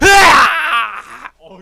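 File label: death, male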